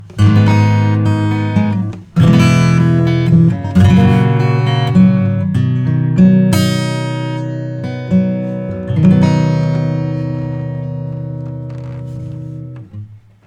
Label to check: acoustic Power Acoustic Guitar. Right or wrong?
Right